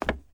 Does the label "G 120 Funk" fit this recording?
No